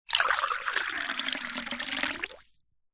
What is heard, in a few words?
food drink water pour into tumbler drinking glass